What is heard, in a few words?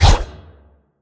miss; weapons; genji